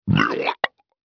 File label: puke